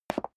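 step leather shoes concrete single stair down, footsteps; schritte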